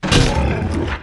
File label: Pain